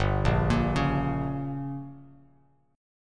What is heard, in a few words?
G Diminished, Bass Standard; arpeggiated; JGuitar